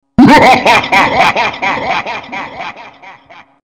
evil laugh; gesource; xshemps customs; cguard

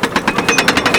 gate rise, doors; gesource

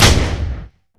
phantom fire npc, weapons

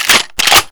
grenadelauncher reload; weapons

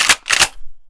shotgun reload; weapons

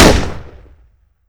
phantom fire

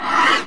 metal scrape; gesource; doors

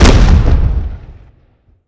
grenade launcher fire; weapons; glauncher

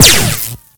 fire

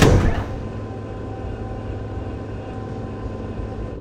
bunker door move; gesource; doors

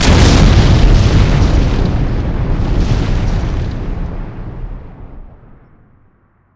explode

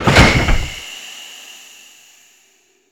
Large Blast Door OPENED; gesource; doors

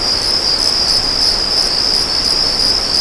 crickets; gesource; DuClare Chateau

